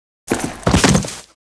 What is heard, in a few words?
dive over wall